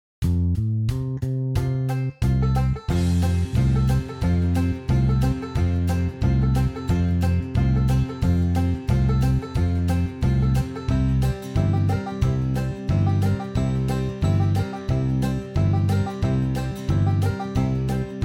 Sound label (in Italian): Suolo Strumentale